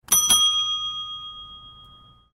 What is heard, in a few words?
desk bell hit ringtone